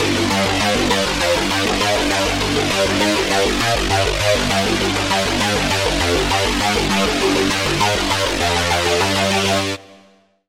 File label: Lead; Doom Accelerator; Routed Sound; Serum 2 Expansion - Doom Carpenter Vol.1